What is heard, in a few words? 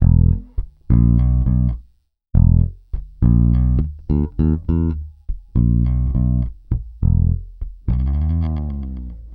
Pop, Bass